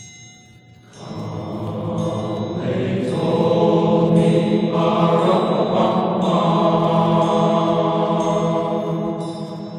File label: Carol Of The Drum; Christmas in Cleveland; Cathedral Latin High School